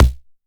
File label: Kick Ring